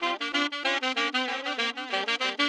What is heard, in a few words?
OLDSCHOOL BRASS 96